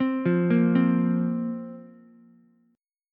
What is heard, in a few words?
C 7th Suspended 4th, Ukulele; arpeggiated; C7sus4; 5,5,6,3